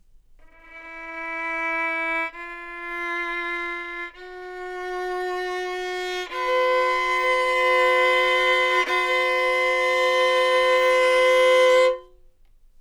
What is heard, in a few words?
violin Fear